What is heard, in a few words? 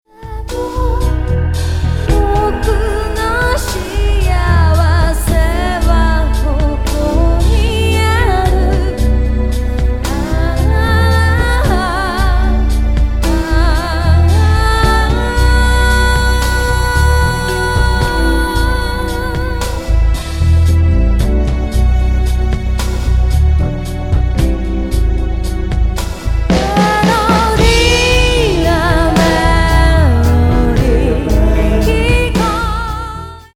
melody